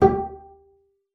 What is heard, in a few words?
G4, bass pizz; Xsample VOL-5